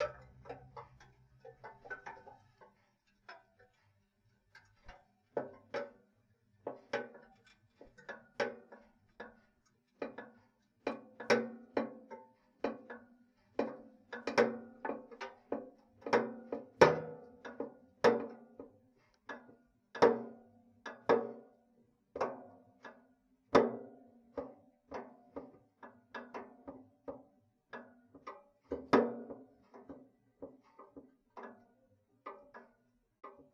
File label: Cool Down, Toaster; Kitchen